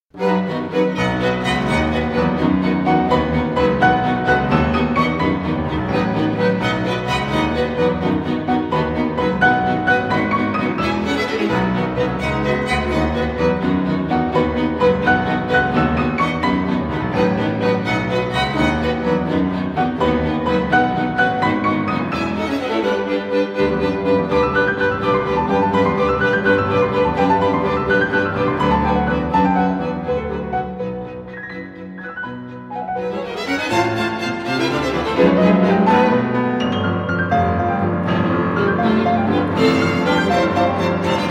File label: piano 3rd mov; Shostakovich